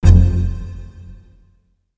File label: Low Battery Alarm, Windows Longhorn